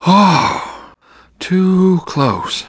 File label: sigh; Cars